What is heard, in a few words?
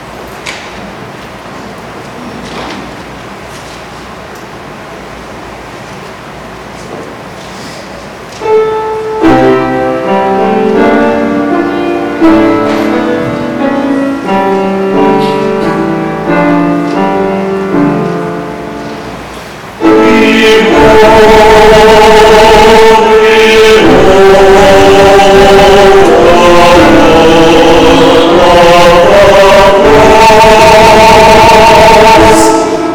Choral Call to Worship